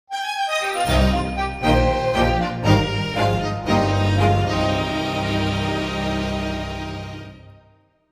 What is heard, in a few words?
jingle